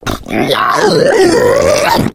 female boomer lurk; voice; idle; Left 4 Dead 2

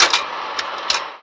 slide change; ambient; Counter-Strike Global Offensive; office

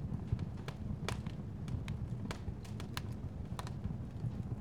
Fire Loop; Mount&Blade Warband

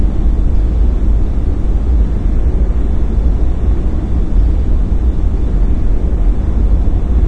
big warehouse ambience loop; ambient

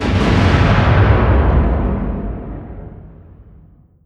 distance super heavy battleship attack; Hearts of Iron IV Death or Dishonor

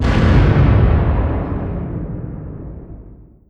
distance ships destroyer attack; Hearts of Iron IV Death or Dishonor

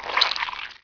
crush guy; Emperor - Battle for Dune

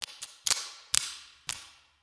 rat path; ambient, creatures; Left 4 Dead 2

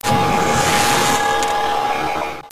white flash, energy; Garry's Mod; hl2

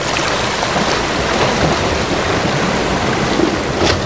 sea loop; ambient; AssaultCube; Alien Stars; American Muscle Series

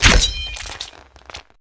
sword hit lo armor hi dmg; Mount&Blade Warband

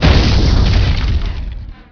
explosion; ordos; Emperor - Battle for Dune

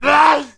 man hit; Mount&Blade Warband

